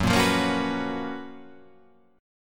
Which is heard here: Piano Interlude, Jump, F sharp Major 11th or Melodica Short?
F sharp Major 11th